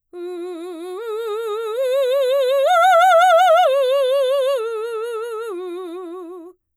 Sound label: arpeggios f slow forte u, vocalset